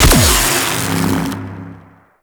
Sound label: Shot, Arc Rifle; Coda Synapse; Infested; Weapons